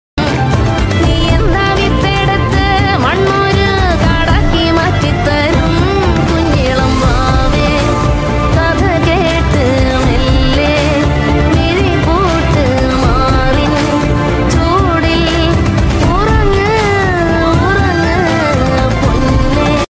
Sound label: song climax scen bgm malayalam; tovinothomas